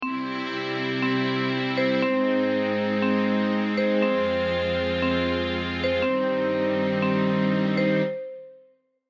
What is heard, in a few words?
common chords